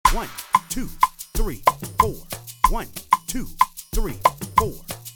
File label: african highlife loop 93bpm, talking drums; african highlife loop with talking drums